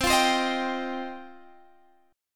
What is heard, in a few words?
G 7th Suspended 4th Sharp 5th